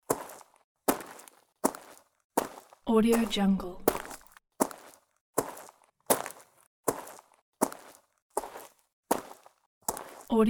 footsteps walking on small rocks; audio-therapy

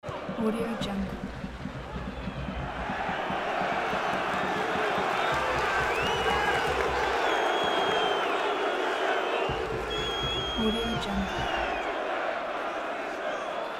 football stadium crowd

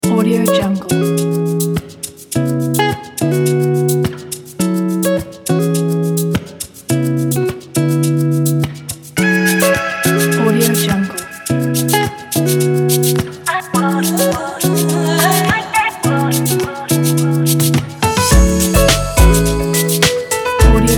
bright hip hop intro

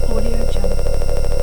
scifi machinery loop

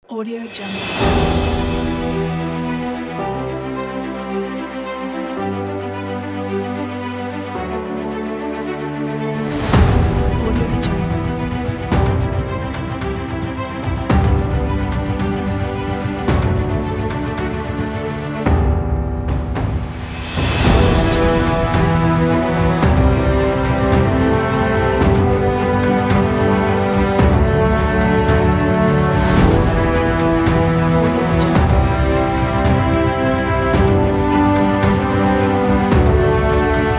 epic trailer; autz

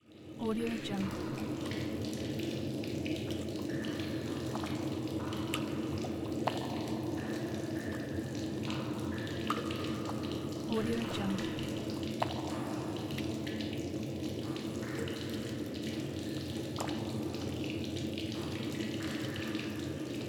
sewer

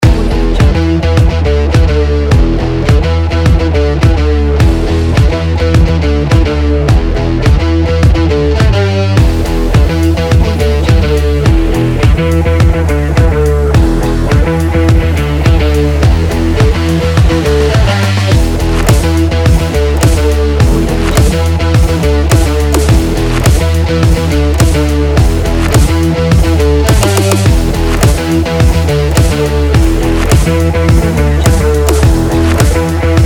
pulse pounding electronic rock

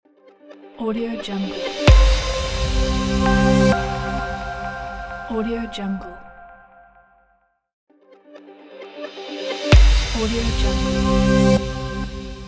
flat logo

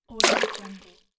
water slosh

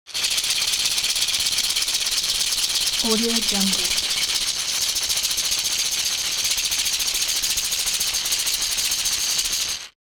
object shaker wood rough rattle fast hard; smartsoundfx

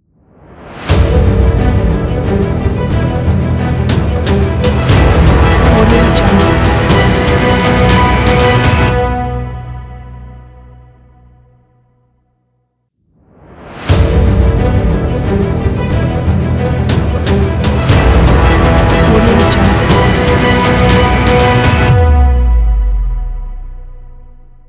an epic logo

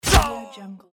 unit death

